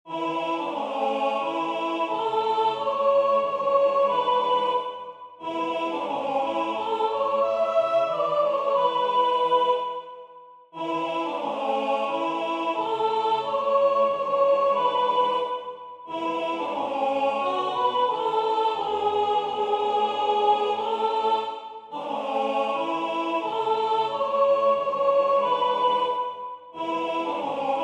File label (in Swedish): SOPRAN; Hosianna